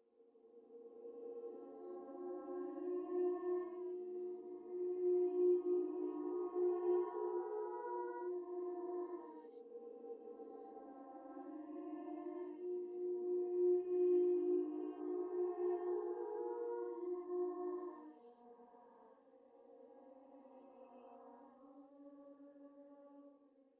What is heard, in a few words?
cheetah